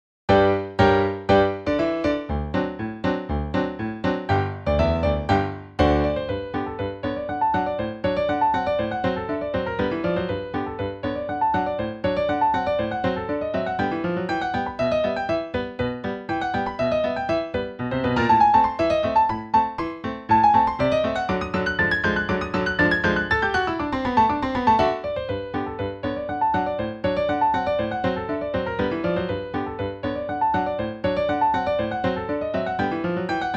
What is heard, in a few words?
polka